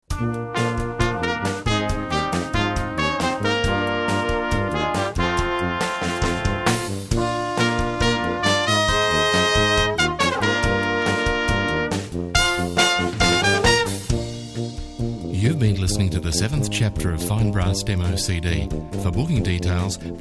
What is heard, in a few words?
Brass